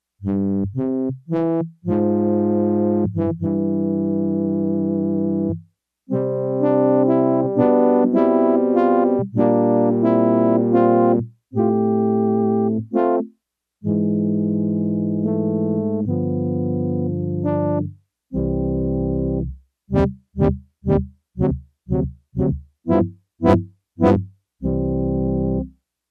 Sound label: FrenchHorn, Yamaha TX802; Factory Patches; Voices